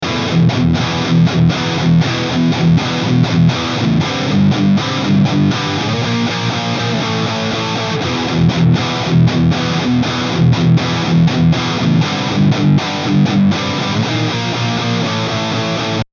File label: NECK III, eclipse active 5150